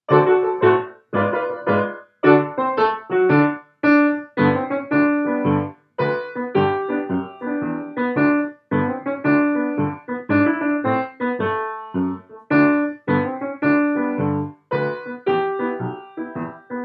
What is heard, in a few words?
finale instrumental